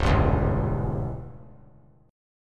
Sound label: Db Diminished Db down; strummed; JGuitar